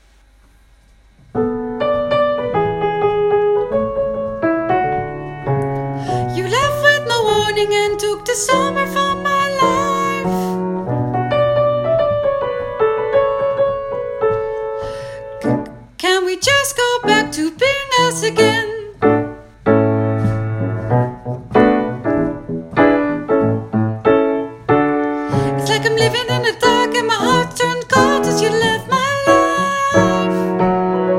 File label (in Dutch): Rain sopraan